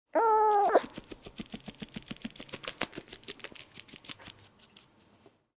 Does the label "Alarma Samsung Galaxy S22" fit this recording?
No